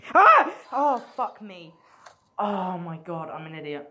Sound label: ahh